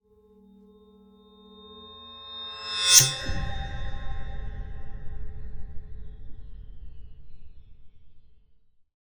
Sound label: Swell To Impact Metallic; bottlerocket